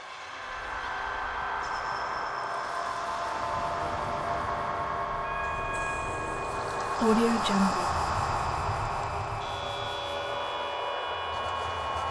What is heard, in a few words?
washy noises background